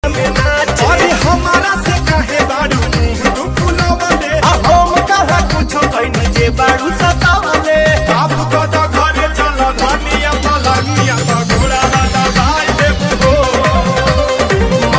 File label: saxy mood song saxy groove music